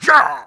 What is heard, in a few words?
pain; quake2; mnhcoop; quakeguy